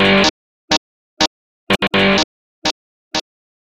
guitar bit chop

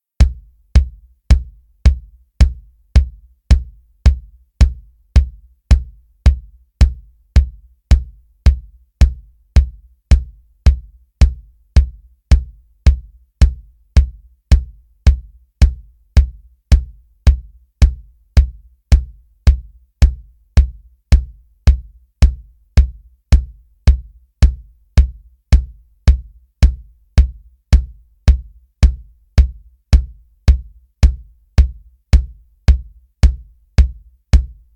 drums

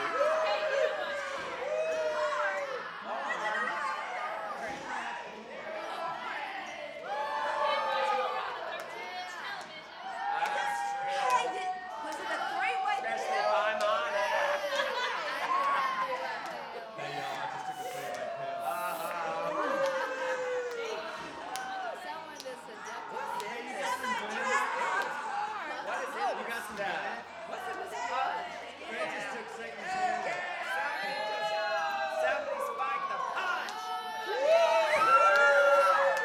Party Track; Effects

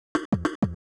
drum fill; Multitrack

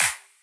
128BPM Kit solo

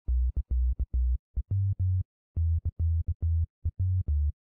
Bass Break; Remix Sample Pack

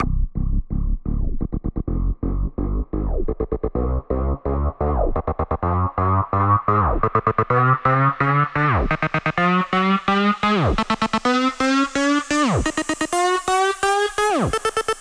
128BPM Rise Up 8bars

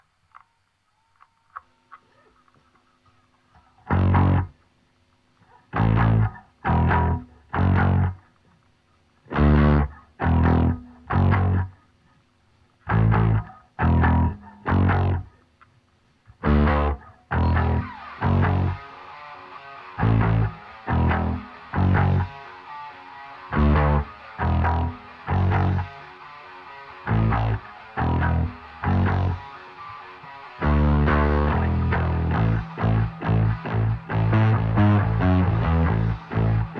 rhythm

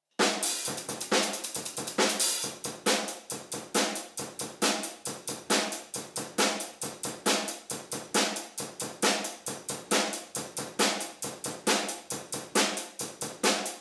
drums

